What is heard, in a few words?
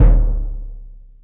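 bass drum orchestral; 300 Drum Machines; Yamaha Motif ES-Series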